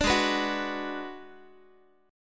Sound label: G sharp Minor 7th Flat 5th G sharp down Guitar; G#m7b5 strummed; 17,16,14,16,x,x